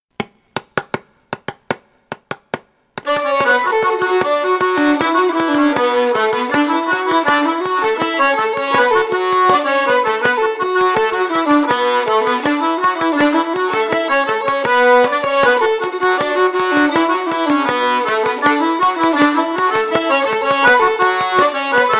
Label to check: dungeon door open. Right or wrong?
Wrong